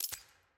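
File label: team push to talk release; premade voice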